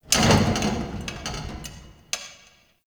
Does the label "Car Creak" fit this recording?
Yes